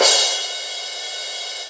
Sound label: Yamaha EX5, Drums